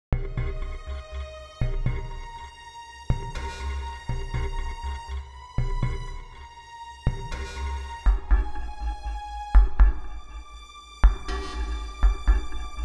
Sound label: Cinematic sample